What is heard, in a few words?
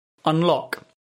Unlock